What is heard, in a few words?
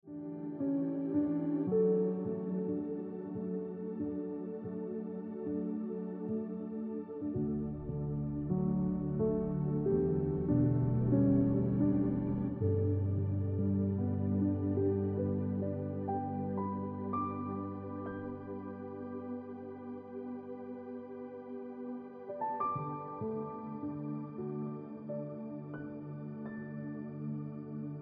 Look Back On Ringtone Piano Music